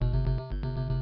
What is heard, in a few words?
Kart Drift; pac man kart rally java